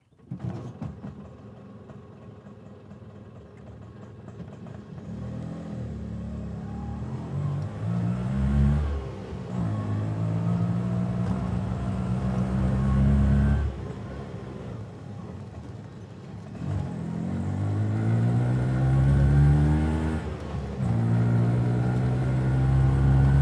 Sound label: Auto - Tuk Tuk - Auto Rickshaw - 4 Stroke - Start - Idle - Drive at various speed - Slows; Tuk Tuks; Slows to stop; The AudioVille